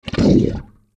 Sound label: Lake Monster